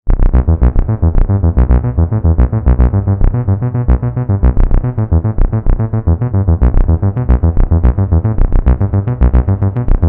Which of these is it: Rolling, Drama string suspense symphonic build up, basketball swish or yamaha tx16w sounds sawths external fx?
yamaha tx16w sounds sawths external fx